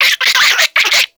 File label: Infested Minion Bark, Vocals; Warframe